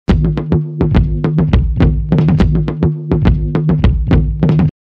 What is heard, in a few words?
attack loop; ATTACK PACK